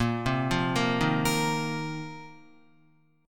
A sharp Suspended 2nd Suspended 4th A sharp, Guitar; arpeggiated; JGuitar; 5,3,5,3,3,6